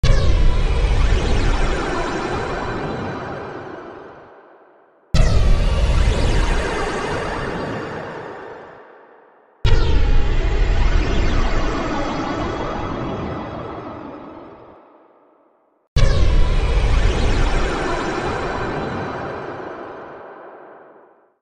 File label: teleport sounds; tv men and scientist